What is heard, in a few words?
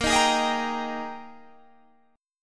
Ab Major 7th Suspended 2nd Bb